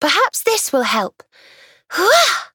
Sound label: ting; dqxi; serena